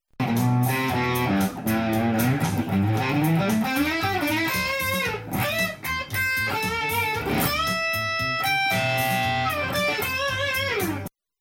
boss blues driver, guitar

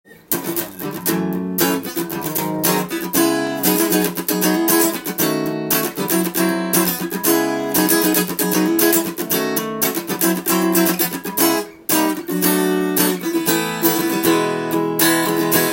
gras root acostic guitar; grassroots g ac 50s; studentguitar

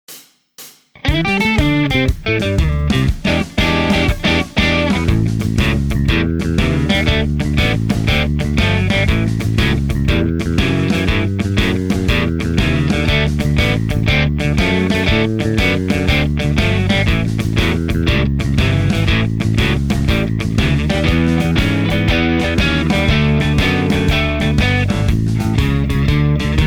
Blues karaoke

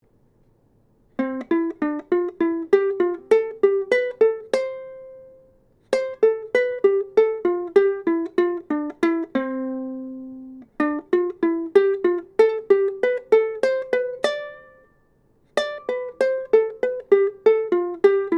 ukulele

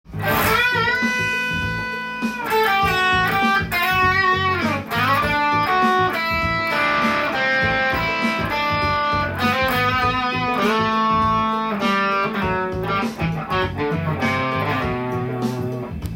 solo; m7 chord phrase

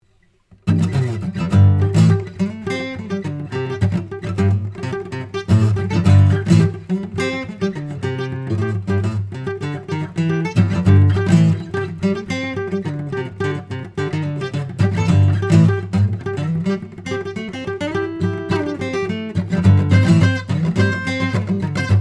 guitar jam

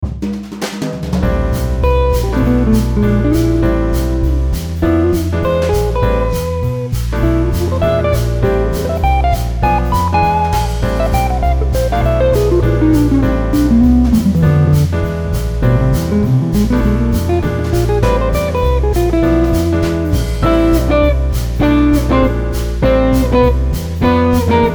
Jazz Drum

